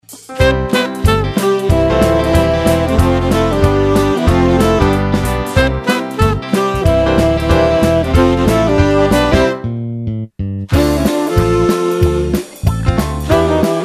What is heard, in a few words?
RELAX WITH SAX